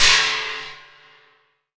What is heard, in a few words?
JABARA CYM, KOREAN DRUMS; AKAI S6000 CD-ROM; WorldSounds